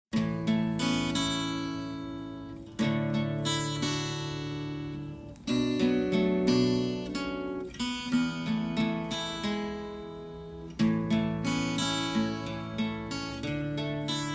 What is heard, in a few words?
(A) No Backing Vocals